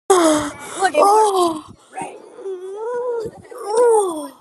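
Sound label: guy moan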